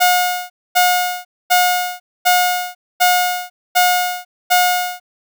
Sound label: buzzer